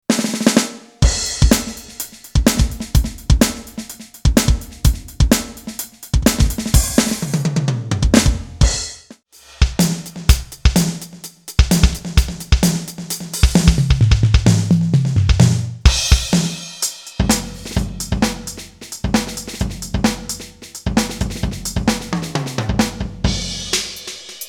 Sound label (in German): Alesis DM10mk2 Drumsounds